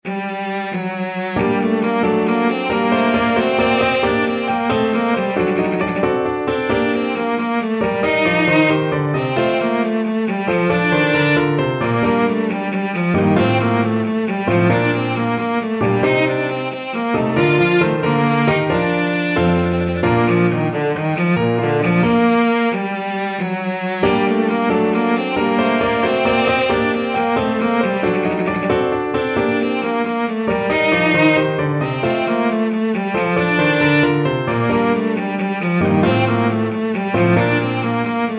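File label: violoncello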